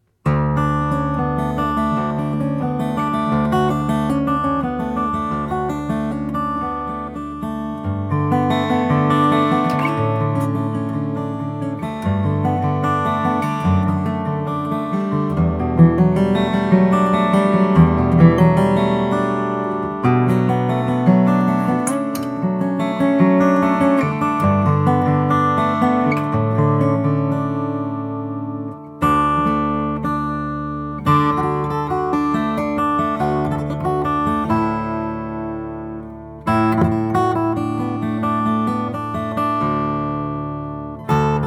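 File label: greenfield g2 nicaraguan mountain, guitars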